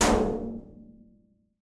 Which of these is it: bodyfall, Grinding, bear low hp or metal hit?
metal hit